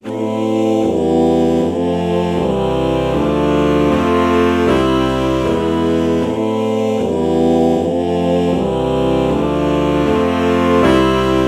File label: alto saxophone oh chord morph